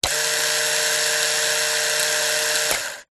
household razor electric male motor whirr close up open; remington